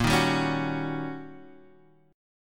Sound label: D sharp 7th Sharp 9th